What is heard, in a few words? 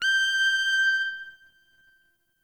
Hip Hop Pack